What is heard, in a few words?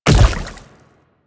Splitter Step Water, Footsteps